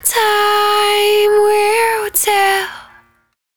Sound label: 110bpm Ab, Velvet Vocal; Zero-G